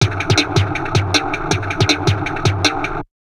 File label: DIST, Drum Loops Crazy Processed; USB Soundscan vol.07; AKAI